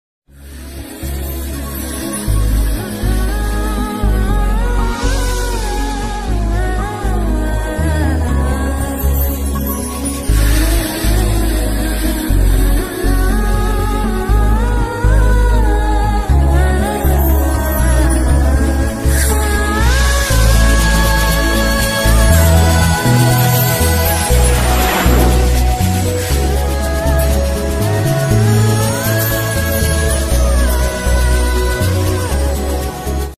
tamil love ringtone; Azhagiya Tamil Magan

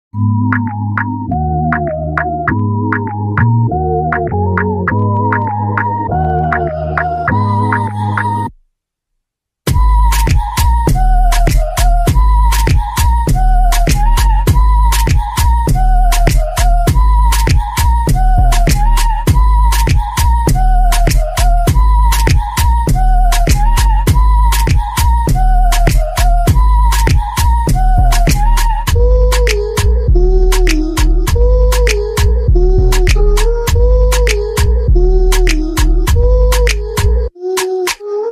instrumental ringtone beats; voodoo

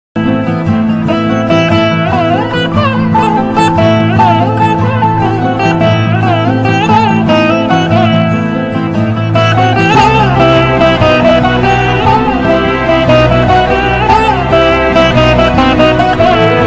ethir neechal feeling bgm ringtone tamil ringtone

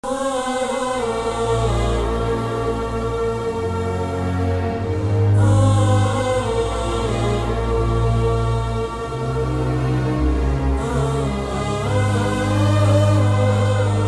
kaatera sad bgm

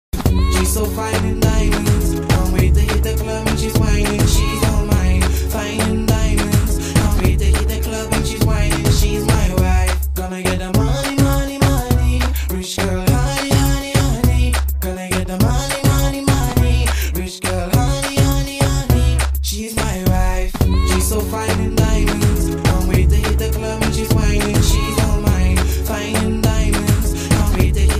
tamil bgm ringtone song bgm ringtone; Ponmagal Vanthaal

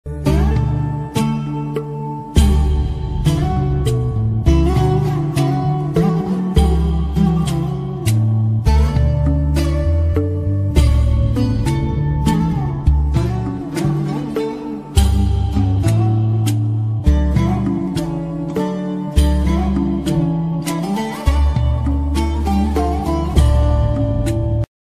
bgm; aadujeevitham; the goat life

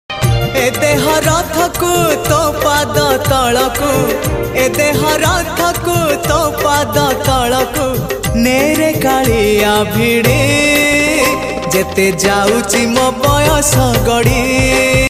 bhajan song odia; lord jagannath

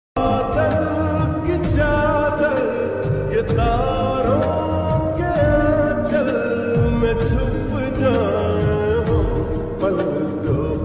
lofi, indian lofi, bollywood lofi

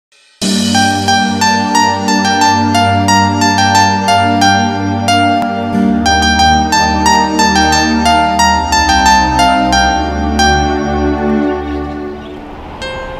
music song ringtone

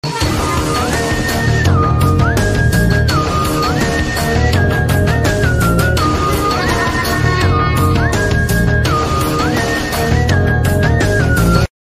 bgm ringtone telugu ringtone; panja; pawankalyan; tollywood; pspk

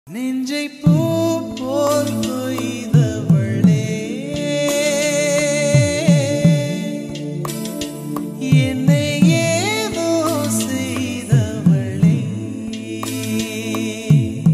bgm ringtone dark vibes

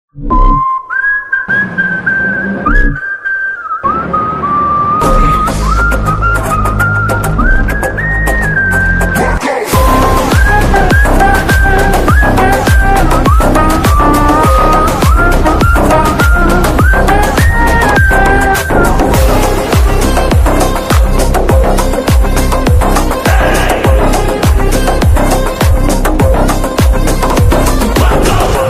bgm ringtone; marco

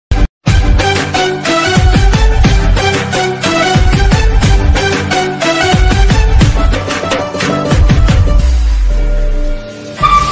flute ringtone; diwali special ringtone; dipawali ringtone; diwali ringtone 2021

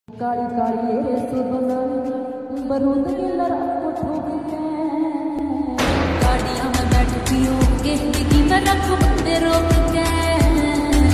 lofi ringtone

